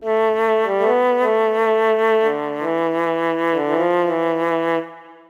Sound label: Flute, Ethnic; Form Flute